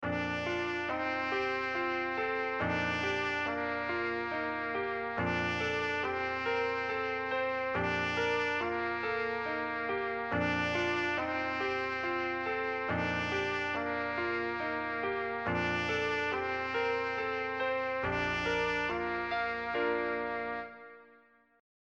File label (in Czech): hali beli trubka klavir; Halí, belí - česká národní